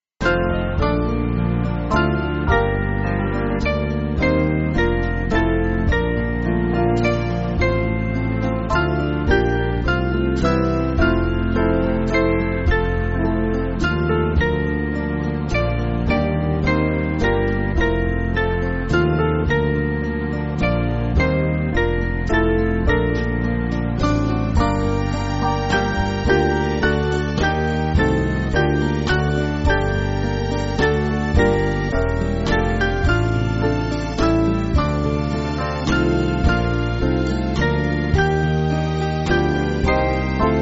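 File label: Band, Free Music Backing Files for Churches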